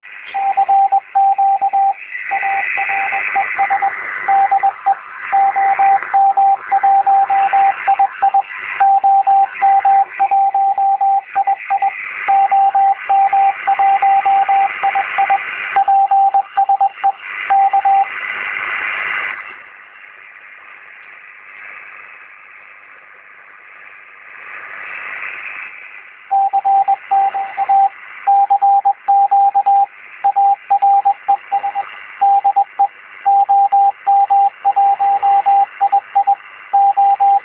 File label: 5MHz CQ telegraf